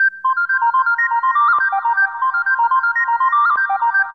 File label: synth flute sequence G 120; Trance Formation